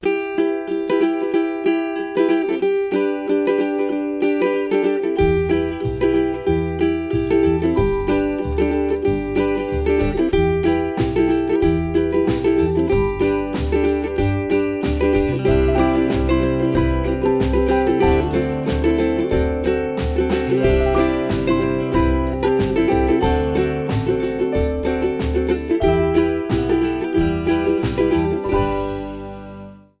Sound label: Pleasant Strumming, ukulele songs